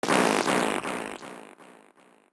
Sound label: garbag death; fight knight gamerip